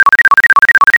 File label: TONE; cuddle n care